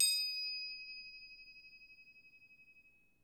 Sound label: D#4, Percussion & Harp; Miroslav Vitous - Percussion & Harp